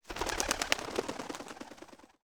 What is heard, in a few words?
bird fly away; netent